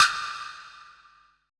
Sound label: METAL; BPC PERC